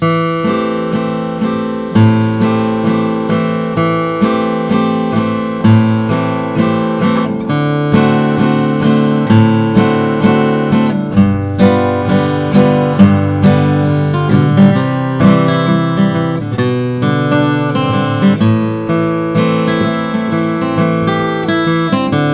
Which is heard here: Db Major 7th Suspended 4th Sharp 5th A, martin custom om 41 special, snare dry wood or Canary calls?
martin custom om 41 special